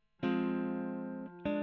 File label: E. GTR Gefell